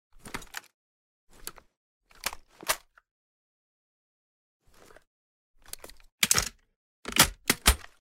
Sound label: db short inspect; emx boomstick